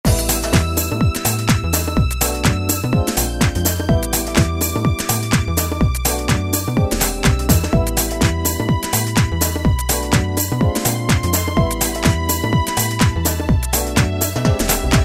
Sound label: Garage House, Roland D2